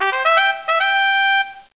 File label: Charge Fanfare; Cheesy Lo-Fi Sound Effects